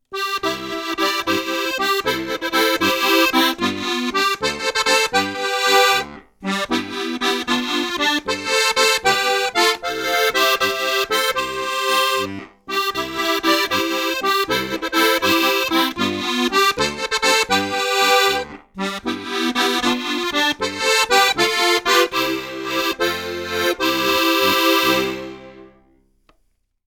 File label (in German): Tremolo stark, steirische harmonika